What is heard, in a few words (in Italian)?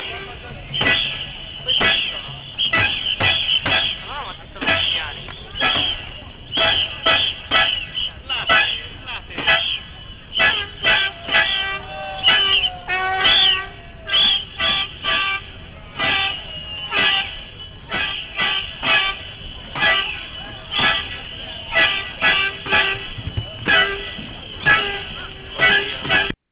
tromba